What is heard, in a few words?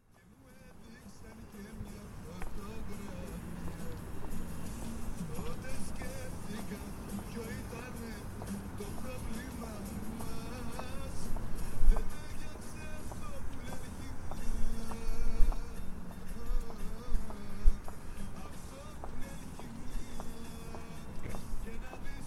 harbour greek restaurant long